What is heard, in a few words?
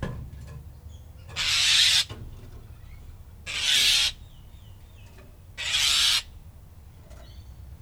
squawk